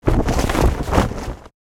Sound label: Flag Flap; ninjago